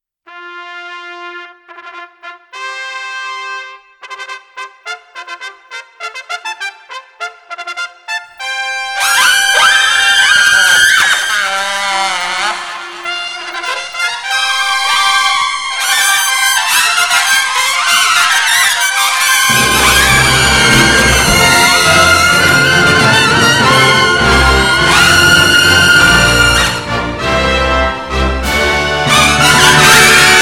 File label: Trumpet Christmas; Trumpet Blooper